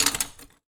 Glass Rustle

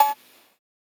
808 Cowbell Dirty Hit

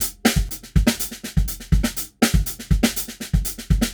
Acoustic Drumloop 122BPM

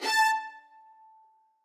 arco note A4

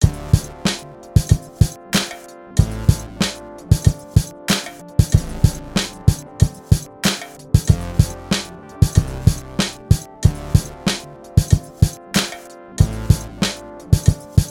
Breaks

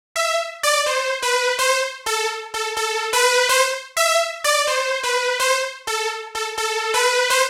126 NORD RIFF Am

